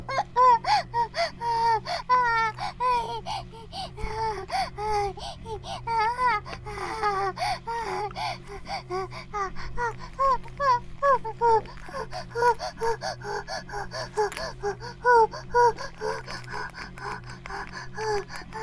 バイブ放置; イクたびにわけて

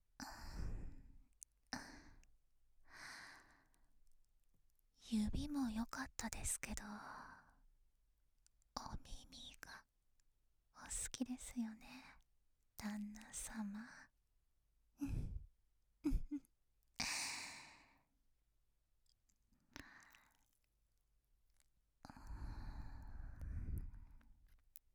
耳舐めとお世話

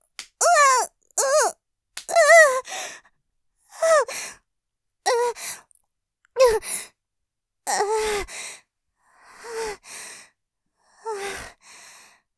絶頂余韻◆はぁ…んぁ…、はぁ；；◆脱力絶頂（声を出さない系）; ループで楽しむ！シェフの気まぐれ抜き抜きコース

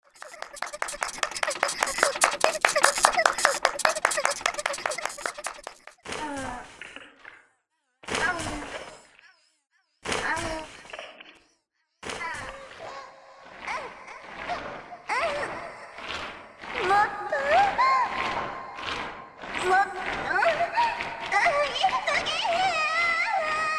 催眠律動音響 セックス サンプル; ぴぐみょんスタジオ作品紹介

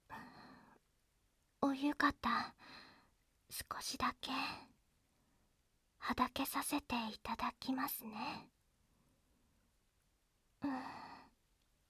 耳舐めとお世話

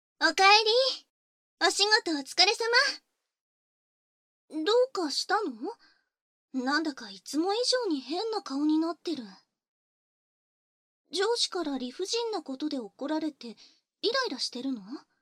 耳舐め手コキ＆淫語囁き, ASMR; ＳＥ無し; イライラチンポを耳舐め手コキ＆淫語囁き応援！

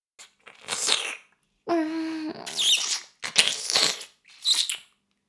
フェラ音声; 牧場戦腺; 少尉

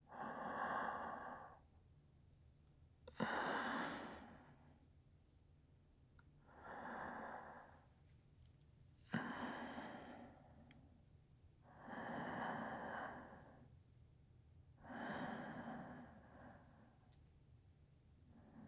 寝息, ASMR

